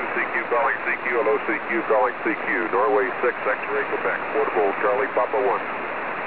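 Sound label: single sideband, ham radio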